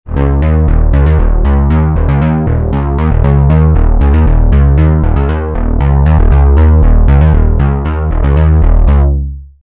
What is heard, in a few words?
ARP Rhythm; Europa